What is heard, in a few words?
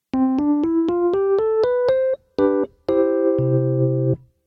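Vintage Vibe Electric Piano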